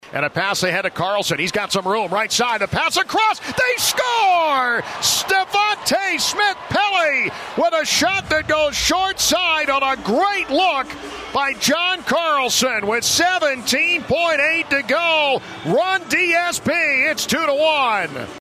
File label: goal; edm; smith pelly